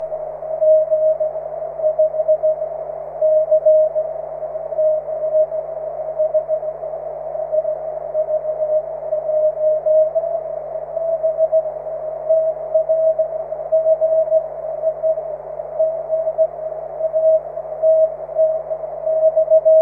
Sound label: cw, 23cm; sv1btr